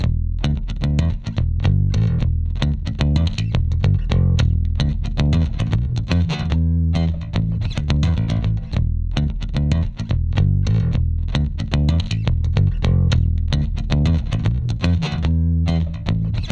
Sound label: Blues Bass Scratchy E 110